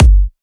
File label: Kick, Psytrance; One Shots